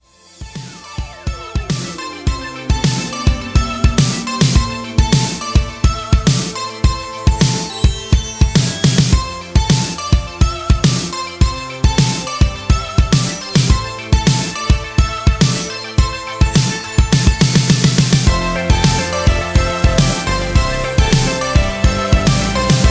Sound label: ringtone